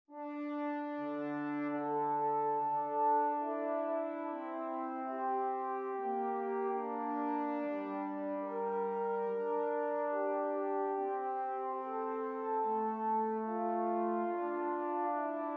Esercizi di contrappunto a due voci; viertes Gattung; Ronge pagina 60

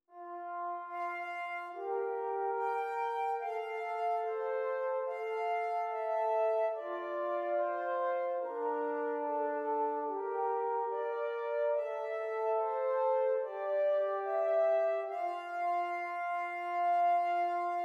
Esercizi di contrappunto a due voci; Zweite Gattung; corretto

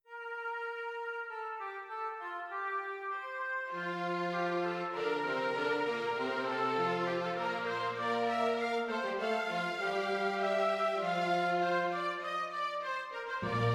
Orchestrazione; Ronge; Gerd Prengel

